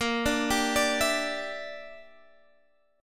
Bb 6th Flat 5th, Guitar, Half Step Down

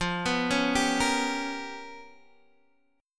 G 7th Sharp 9th Flat 5th

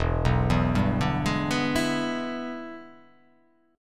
E 7th Sharp 9th, chord

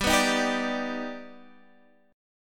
Eb Augmented Major 7th G

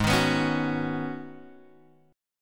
G sharp Minor 11th G sharp Guitar Open G, chord

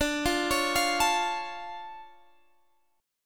D Minor Major 7th D down Guitar Standard; DmM7 arpeggiated; JGuitar

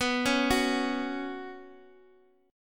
B Suspended 2nd

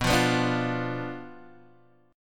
B Diminished B Guitar Half Step Down

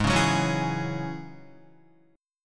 G sharp 7th Suspended 4th Sharp 5th, Guitar; strummed; chord; 3,4,6,6,4,4

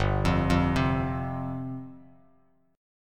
G 7th Suspended 4th G down Bass Standard; G7sus4 arpeggiated

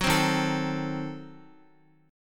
Eb Diminished 7th, Guitar; Ebdim7 strummed; x,x,4,5,3,4; 4E,4A,5D,5G,5C,6F; JGuitar

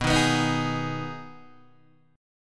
B 6th B down Guitar Open D

